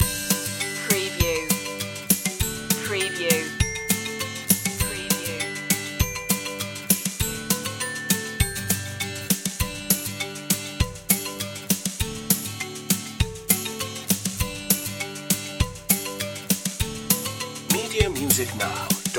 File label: skip to my lou loop